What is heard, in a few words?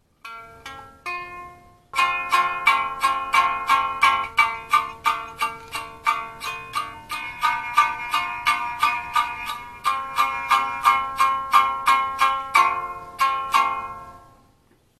Erhu Strumming; 3-String Tin Can Erhu